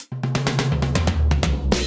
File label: Tom Fill 128bpm, Session Drums Studio; OldSchool; Ableton; Factory Packs